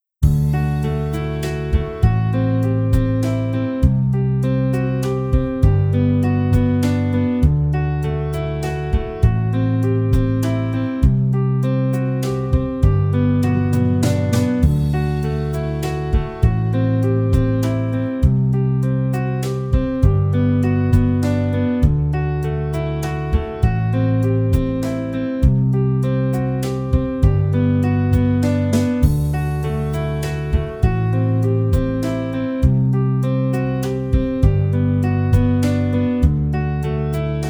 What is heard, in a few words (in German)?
Playalong hoch; Horn; easy beat vol 1 horn new